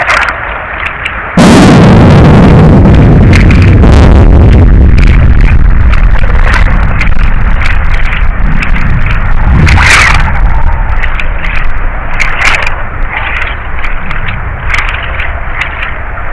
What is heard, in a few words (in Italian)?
okhotsk, Sonificazioni Eventi Sismici; Mare Okhotsk Magnitudo MW8.3 Profondita’ 609Km 24/05/2013